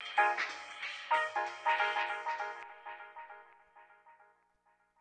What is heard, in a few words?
Stab; Thirty-One Flavors